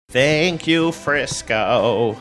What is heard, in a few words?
singing thank u frisco